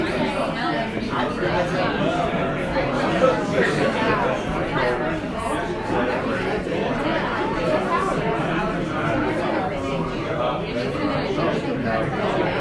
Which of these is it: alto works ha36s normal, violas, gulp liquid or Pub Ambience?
Pub Ambience